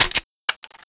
g3sg1 slide forward; czero opforce

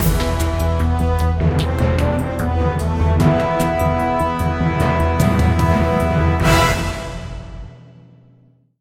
game over, music

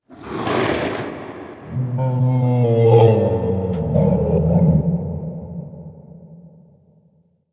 growl; quake2; openffa; world

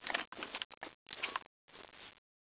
Cloth

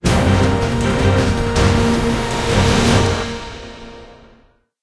round win; music; ZB human

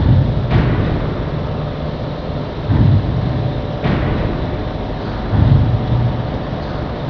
Industrial Pounder Near; de alliance

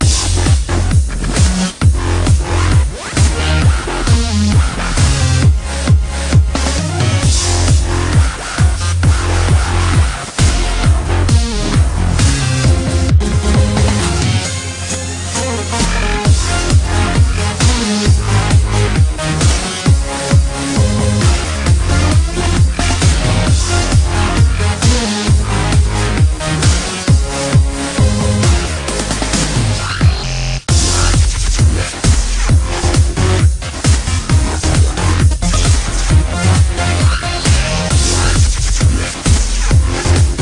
dubstep short

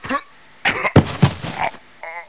die, player

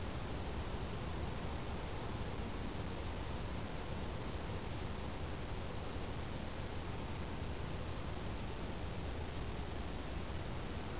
outside; kopovcasino

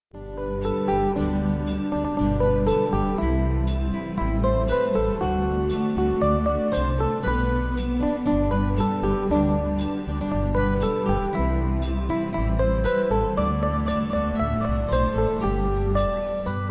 jingle; cstrike

